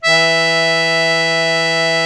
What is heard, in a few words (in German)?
AKK ORGAN E1, Akkordeon; Best Service; Alpine Volksmusik